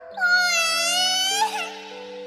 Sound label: oohheee